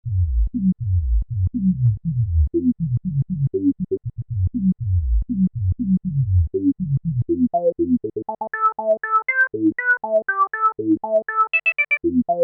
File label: Yamaha EX5R filter, lpf12 + lpf12 ser q100